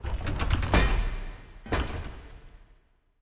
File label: smelter puzzle move full